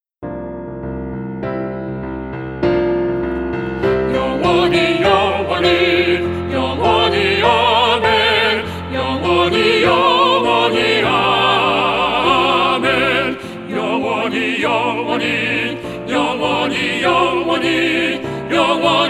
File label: CHOIR